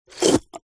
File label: household cafetiere plunger lift coffee inside; zapsplat